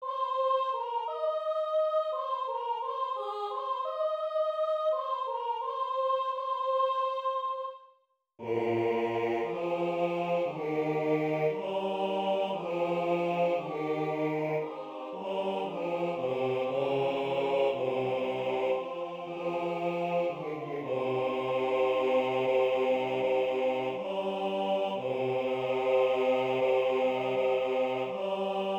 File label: Haec Dies Bass dominant